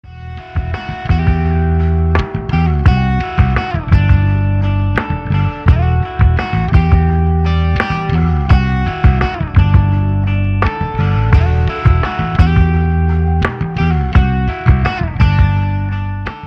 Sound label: Drop Rain